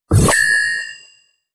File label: Shield